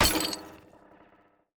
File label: wither beast death